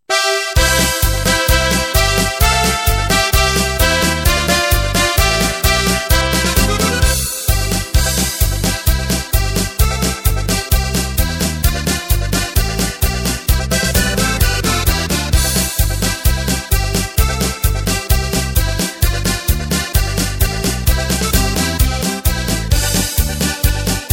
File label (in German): Drums Playback; midifile